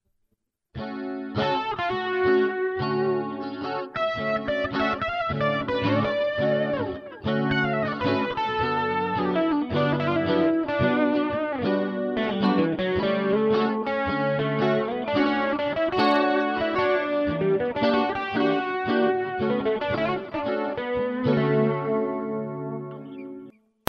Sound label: fusion